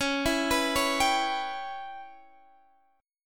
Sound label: E Minor 6th Db chord